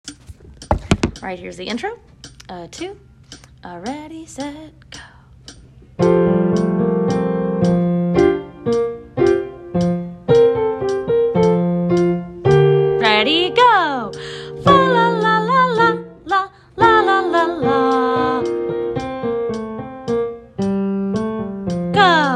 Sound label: alto